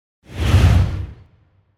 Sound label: Whoosh By Air Classic Passing; Hollywood Edge; sound designer's toolkit v2